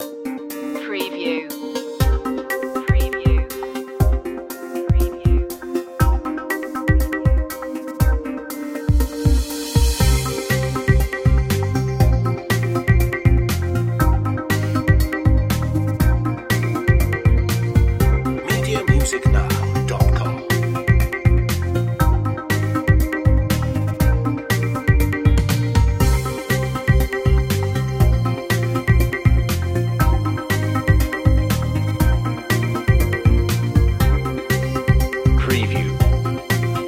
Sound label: smart beat